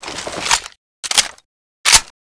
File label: scorpion evo reload; STALKER